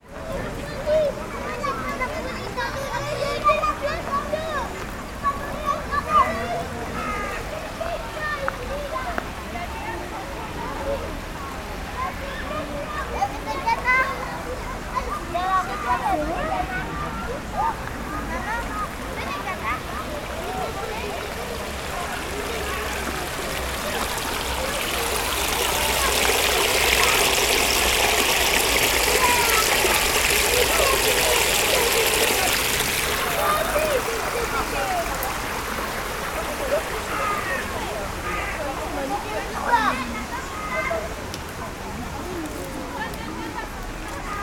Ruisseau Butte Chaumont

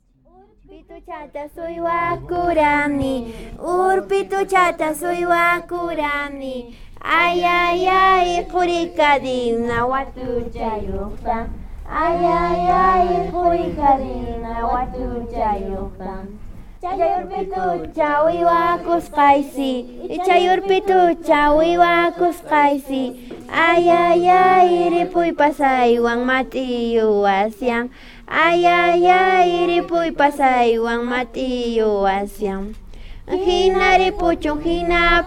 Chants Enfants Poroy Perou

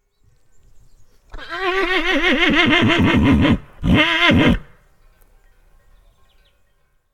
Hennissement Merens; Beille

